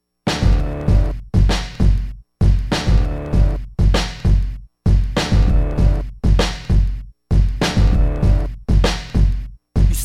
crazy stuff beat

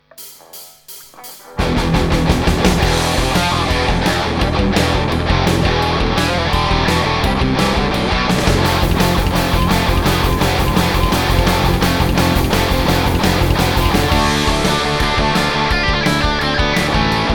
magnets tone

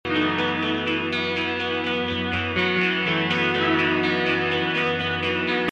guitar

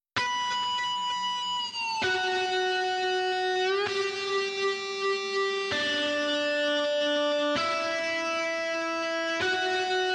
sudden loop, heavy guitars; mixing heavy guitars

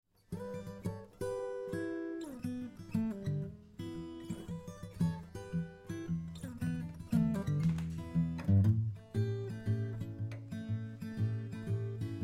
mixdown neck mic position, acoustic guitar; recorded acoustic guitar tracks

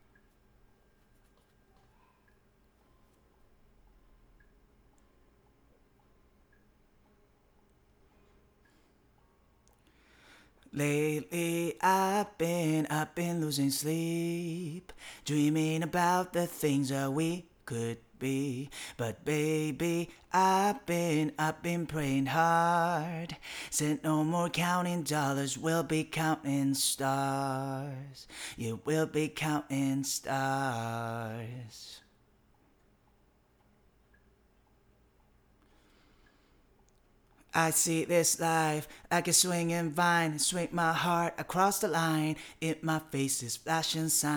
vocal; acoustic guitar track

